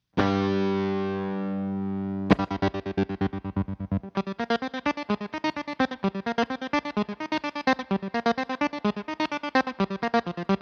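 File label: empress tremolo, Rhythm 4, two speed mode, depth full, square wave setting(with scrabler fuzz); avi's frankenstrat into fender deluxe reverb ii mic'd with sm57. no processing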